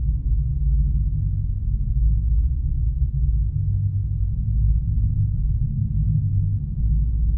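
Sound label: ambience dark cave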